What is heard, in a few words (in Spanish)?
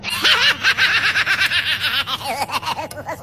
risa